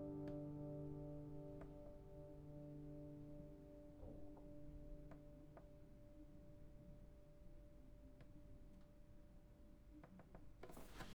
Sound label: tail; Rode NT4; Zoom H2n